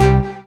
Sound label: Synth Sound; Vengeance Samples; VEE Synth Shots